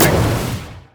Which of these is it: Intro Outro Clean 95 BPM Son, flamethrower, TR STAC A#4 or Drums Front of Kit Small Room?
flamethrower